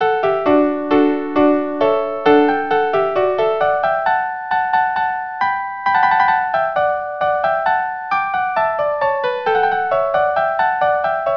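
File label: rondeau violin viola duo; mouret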